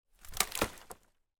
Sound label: impact tree branch small land on other branches on forest floor